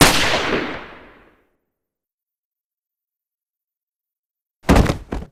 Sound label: shot faint; amara